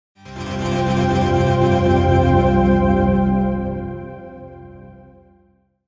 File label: surreal tone